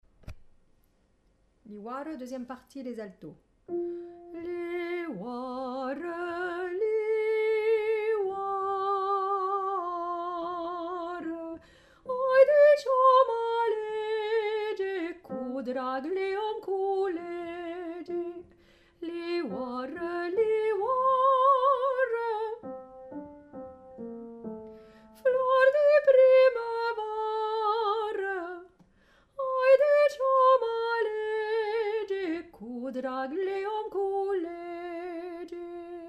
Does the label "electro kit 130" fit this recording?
No